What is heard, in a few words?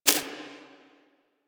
Screen Glitch